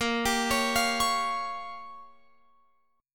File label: Bb Minor 6th, Guitar, Open F; Bbm6 arpeggiated